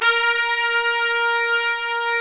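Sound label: brass trumpets, FX; Roland L-CDX-03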